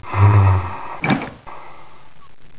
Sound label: step